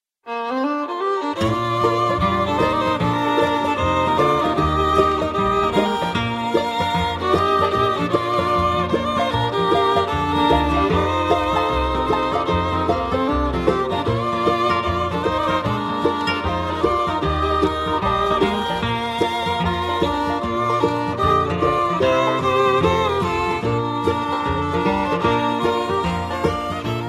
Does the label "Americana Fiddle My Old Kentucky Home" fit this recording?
Yes